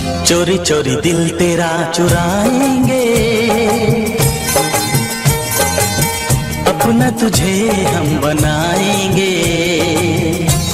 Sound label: Song Ringtone